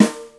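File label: snare bh, Yamaha Motif ES drum machine; 300 Drum Machines; Yamaha Motif ES-Series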